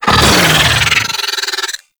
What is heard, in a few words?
Pet Vocal Angry; Warframe; Alad V Pet